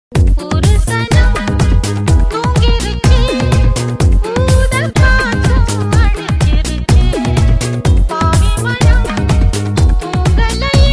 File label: Tamil club mix ringtone